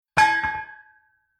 Mechanical Large Metal Pipe Dropped On Ground Single Impact